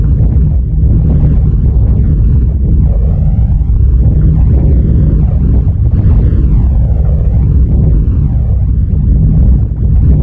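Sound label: Aircrafts F-15 Cockpit Plane Fur Wing Vibration Turbulence In; DCS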